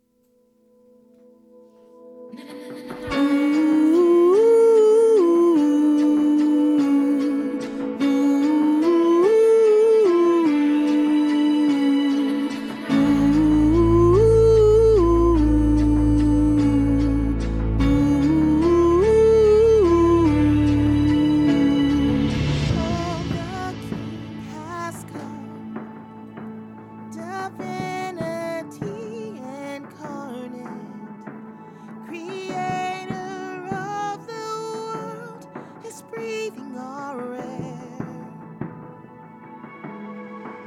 Alto w Lead; Alto Parts; christmas choir

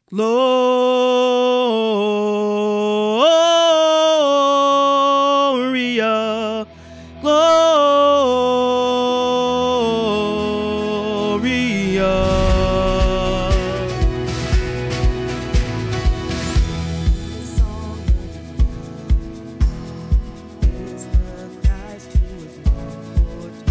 Tenor w Lead, choir; christmas choir

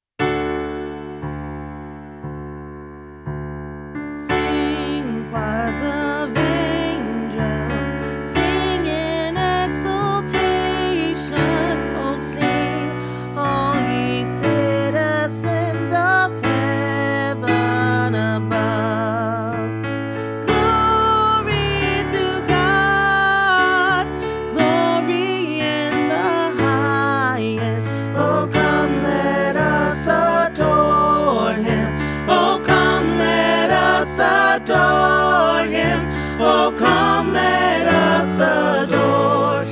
Full Choir, christmas choir

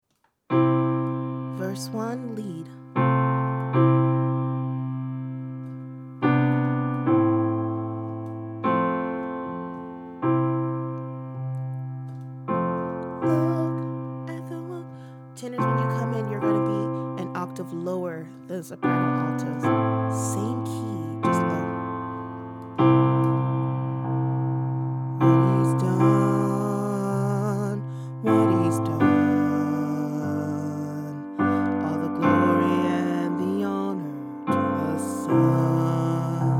Full Choir Tenor Bari; summer choir

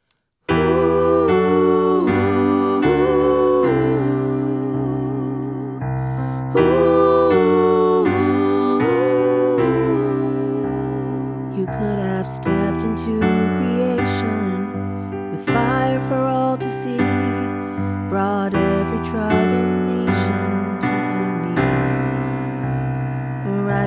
Full Choir, christmas choir

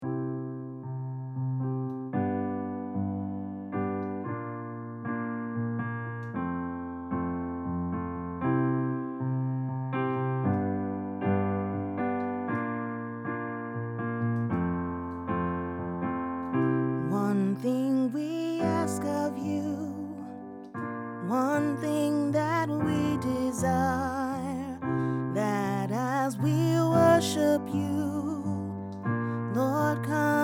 Arise Lead w Tenor; summer choir

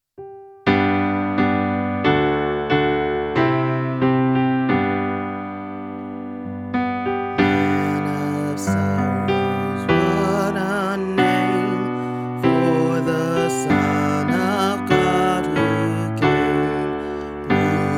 Full Choir, christmas choir

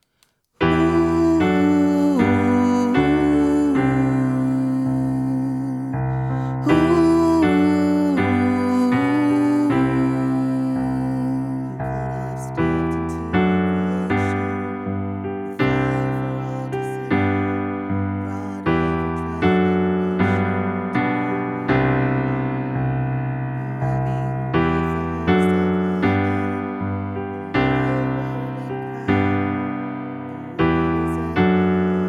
Tenor w Lead, christmas choir

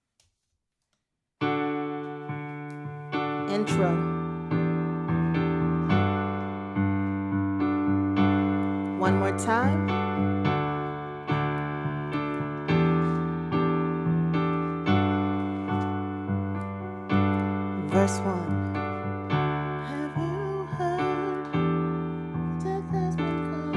Savior of the World Sop; summer choir

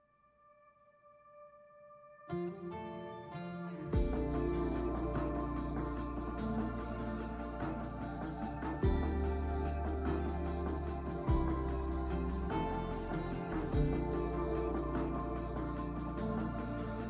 Lead Tenor, choir; summer choir

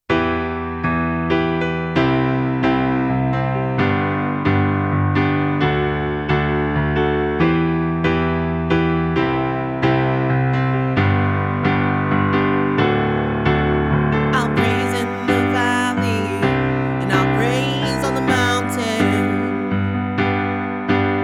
Praise Opener G Full Choir; christmas choir

